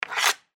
hockey stick scrape

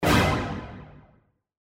Warble Blast

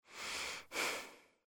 heavy breath

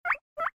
guinea pig squeak

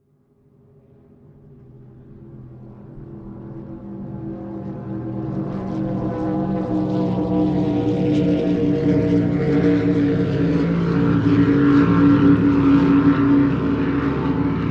boat

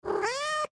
cat purr meow

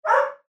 dog bark

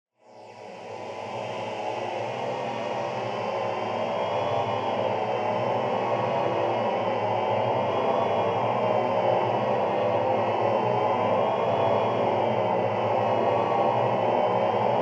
ghost moan

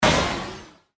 Burst Digital

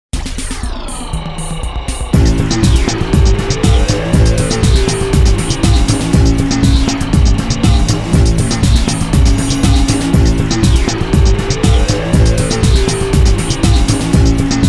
Grooveloop; Soundzabound

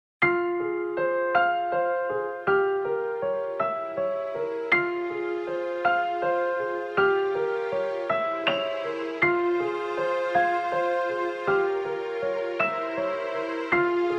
Piano in F minor

